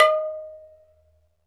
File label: PERC, Dance Mega Drums; 90 s Sample CDs; Best Service